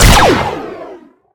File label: bryar pistol